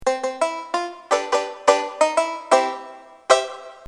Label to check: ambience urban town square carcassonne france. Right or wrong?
Wrong